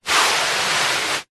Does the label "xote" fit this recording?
No